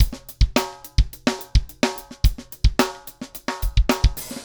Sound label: 108 Latin Jazz Drums; Pro Tools Essential Loops; Digidesign